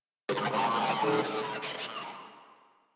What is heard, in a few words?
Moa Vocal Attack; Enemies; Warframe